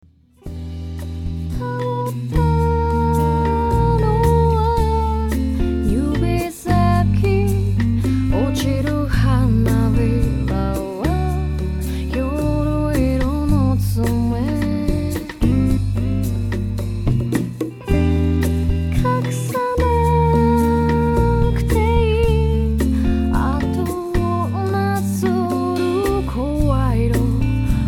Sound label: Mellow Rain; une voix